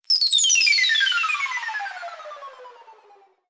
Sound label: magic